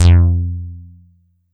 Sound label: bass